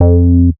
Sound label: bass classic polite F; Polyend; ARC Noise